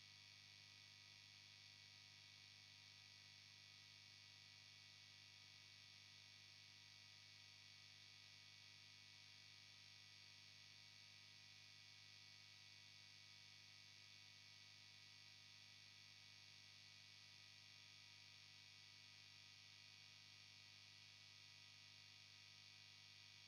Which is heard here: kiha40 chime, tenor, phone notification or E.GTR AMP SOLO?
E.GTR AMP SOLO